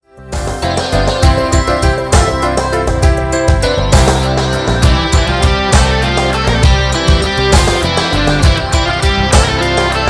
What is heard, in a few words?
The New Halleluja Chorus, Guitar Impressions